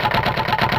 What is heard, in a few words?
Planks; Bowser Jr; New Super Mario Bros. Wii